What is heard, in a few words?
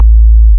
STOMPER